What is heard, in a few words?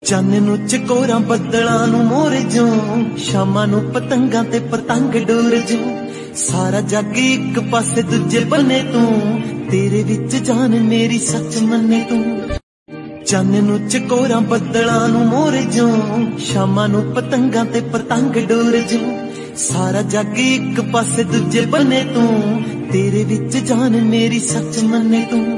Punjabi Song Ringtone